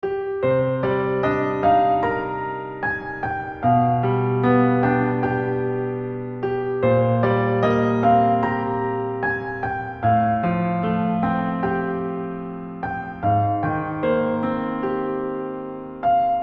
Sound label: Bgm Sentimental